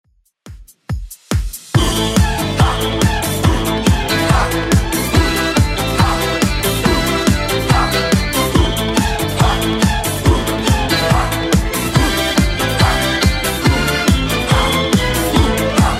70s, 141bpm; Redrum

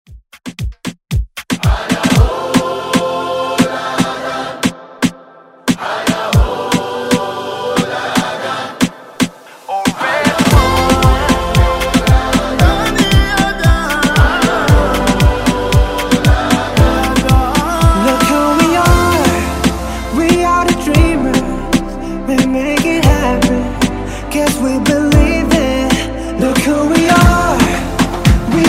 Reggaeton Redrum Intro

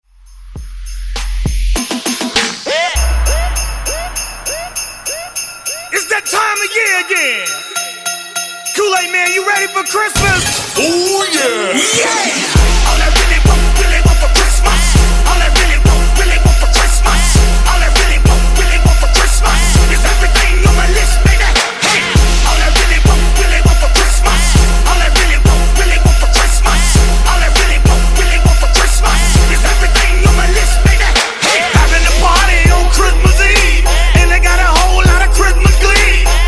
Slam, 100Bpm; Dj Intro Outro

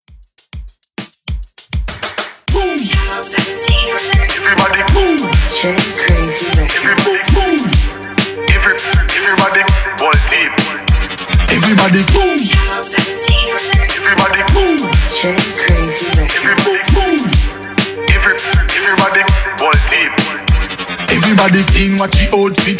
Dance hall Redrum Extended 100 BPM

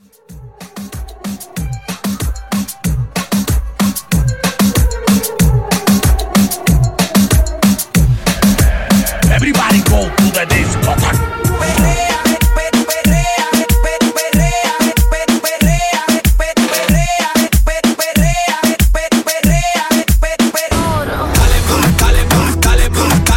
Perreo Party Tool Hybrid Intro

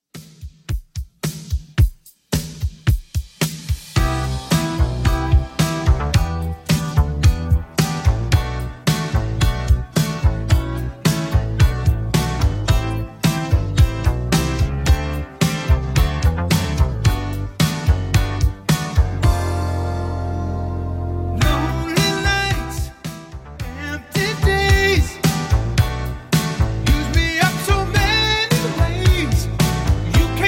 80s Redrum 110bpm

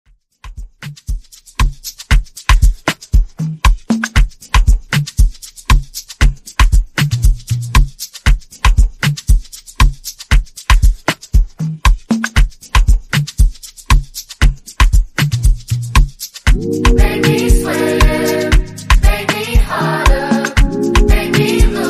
Top40 Mixshow Intro 117 Bpm

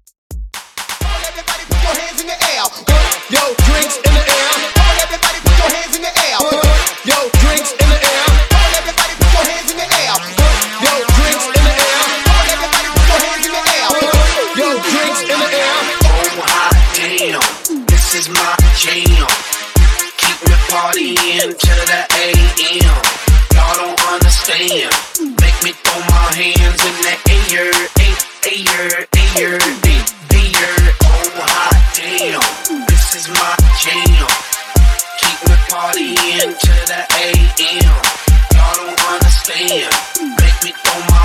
Hype, 128 BPM; Dj Intro

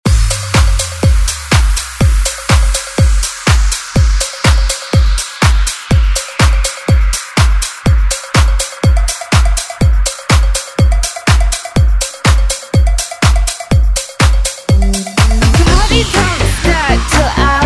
Dance Hype Redrum

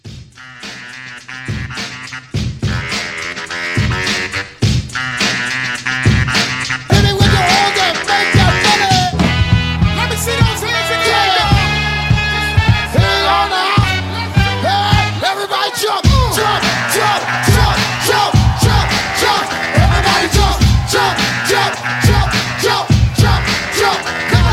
2 Step Segue

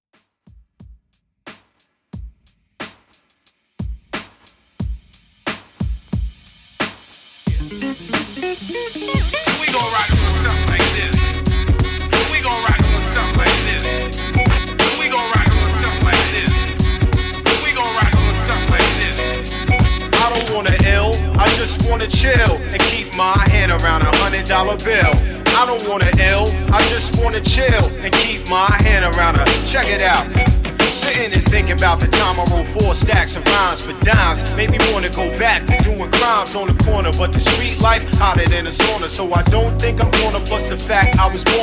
Old HipHop Redrum 90Bpm

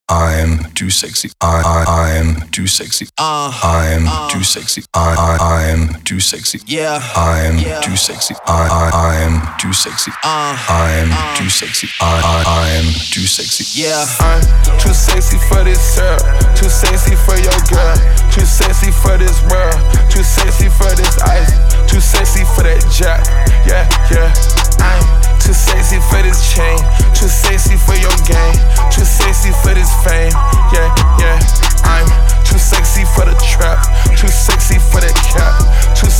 Acapella Hype Intro

